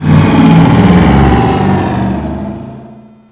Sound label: raider stop; nod